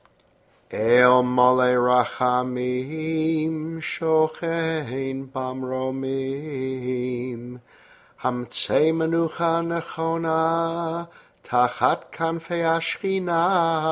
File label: Eil Malei Rachamim Female